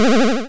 square improvement; Square Waveform sfx

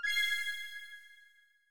confirm, tones; inteface sfx pack 1

